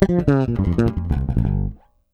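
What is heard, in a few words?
FIERCE FILL, Bass, 158 BPM; Sampleheads; Ultra Freakin' Bass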